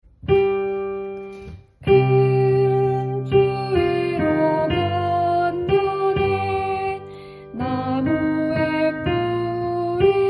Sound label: alto